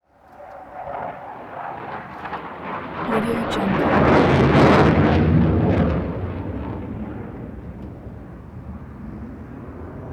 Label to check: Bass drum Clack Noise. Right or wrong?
Wrong